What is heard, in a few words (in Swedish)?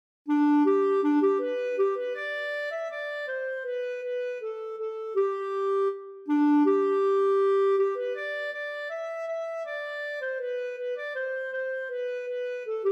ganglat